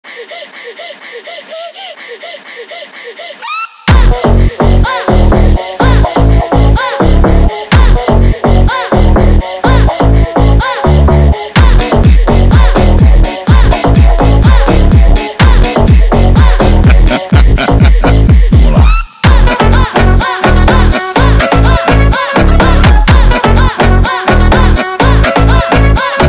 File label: funk